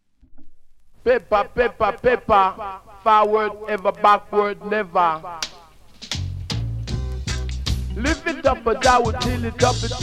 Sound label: jazz